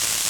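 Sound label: thunderbolt; kult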